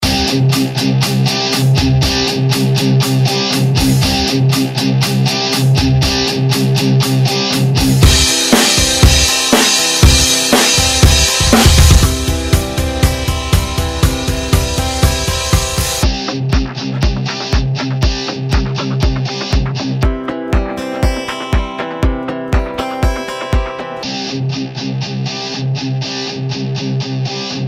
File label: Rock